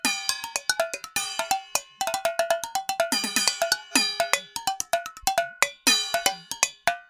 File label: MUSIC SKELETON DANCE